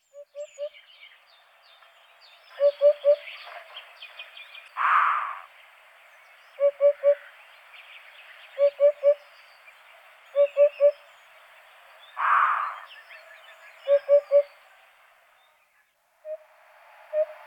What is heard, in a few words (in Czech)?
Dudek chocholaty Upupa epops